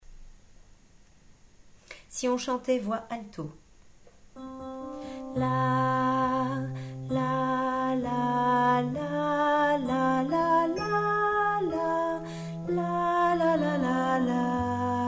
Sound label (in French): alto